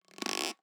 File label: Elastic Rubber Creak